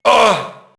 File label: merc get hit; enemy; Max Payne